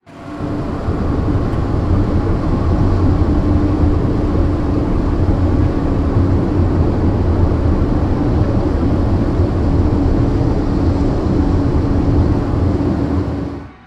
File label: ship engines; World Of Warships